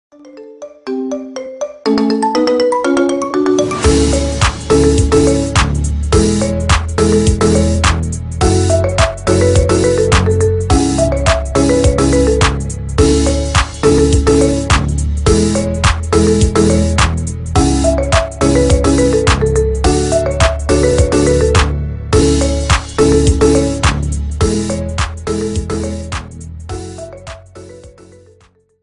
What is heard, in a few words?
marimba ringtone remix